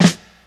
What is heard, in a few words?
SD, dance drums hip